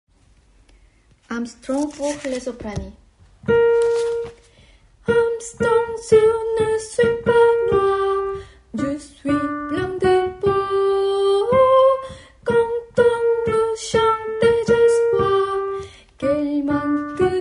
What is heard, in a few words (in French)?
sop et tenor